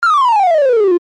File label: Line graph decrease animation infographics